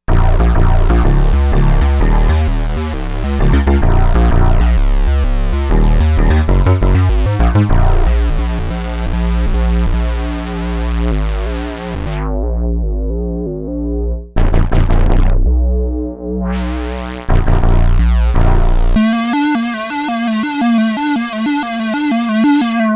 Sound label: Front X Bass